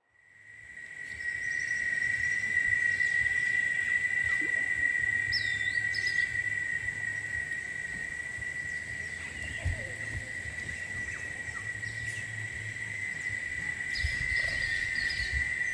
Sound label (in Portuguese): Amazonia cigarras passaros; Gravação de campo; catalogo paisagens sonoras